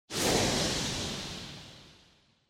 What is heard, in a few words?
Motion Speed Lines Short; モーション スピード線; 短